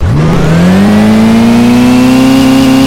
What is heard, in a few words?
vehicle reverse